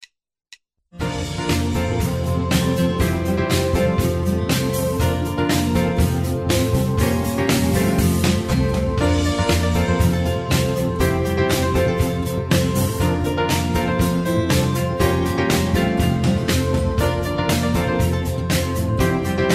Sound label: Low Key